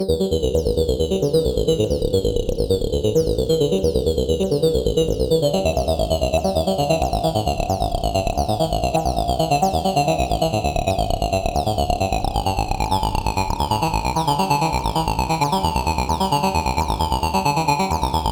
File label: Random Loop Both Filter Maths Modulating 2nd Oscillator Freq reverb